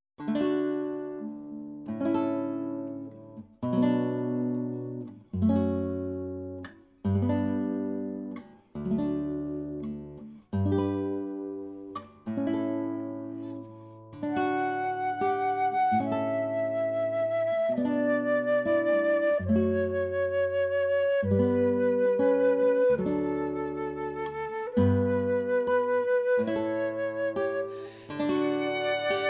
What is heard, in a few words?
Pachelbel Canon